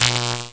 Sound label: zap